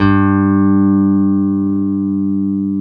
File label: BS, E.Bass, Dark Basses; Roland L-CD701